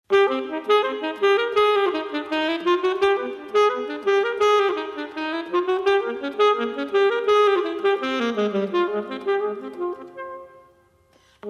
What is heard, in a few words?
Tango